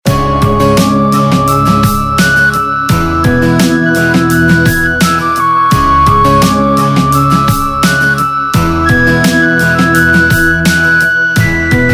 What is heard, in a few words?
Instrumental Ringtone - Piano; Bollywood Hits (2014)